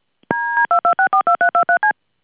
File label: DTMF CID